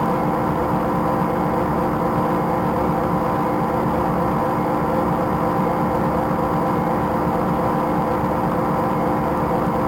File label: generator; ambience; AssaultCube